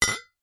foley metal tubes small short fat clunk together single